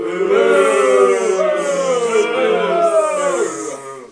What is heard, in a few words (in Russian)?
neodobrenie tolpa so svistom